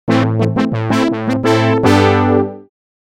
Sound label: brass; opx pro3; ujiie matrix brass